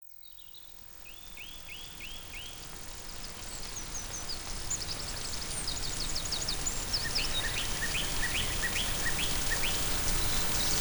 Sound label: Forest Walk, Nature Sessions; RobinHood's SpectraStrobe Nature Sessions